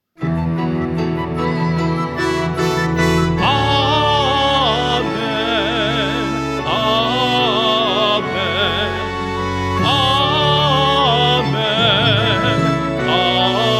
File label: Amen, Mass of Encounter; MOE Parish Demo; Music Ministry